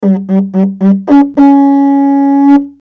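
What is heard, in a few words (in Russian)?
диджериду мелодия отрывистая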